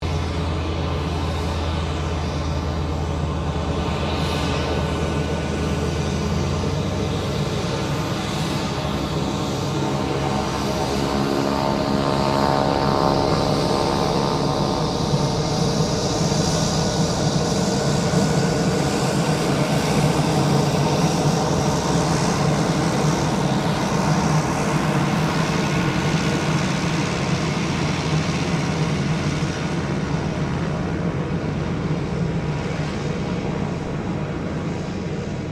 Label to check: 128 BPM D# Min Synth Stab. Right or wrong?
Wrong